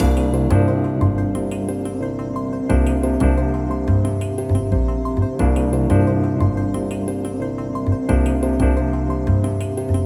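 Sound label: Music Draw Loop; Pictionary; NDS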